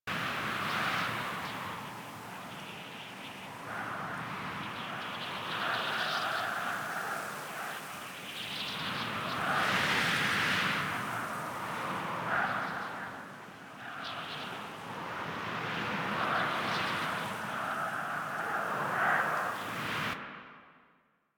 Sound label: night wind grass large loop; Ambience; Props; Loops; Warframe; Eidolon Plains